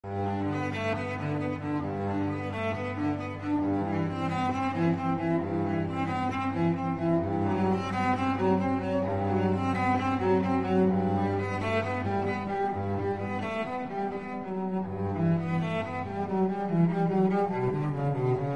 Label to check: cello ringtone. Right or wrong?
Right